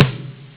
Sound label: fire